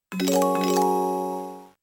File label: CHIME; TwlNandTool